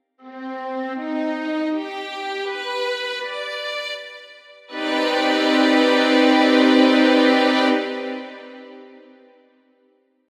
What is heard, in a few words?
chord st mmaj7